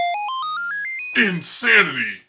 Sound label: jingle insanity